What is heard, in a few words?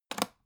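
aluminium case small close short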